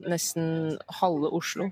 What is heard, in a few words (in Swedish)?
Skrik